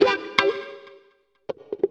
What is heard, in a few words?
125 a, guitar licks; house; Vocal House; PS-28 Vocal House Samples; instrumentloops